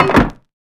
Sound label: Door Lock; FREE SFX PACK VOL 3